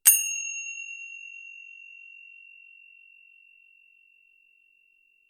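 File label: tibetan cymbal contact sound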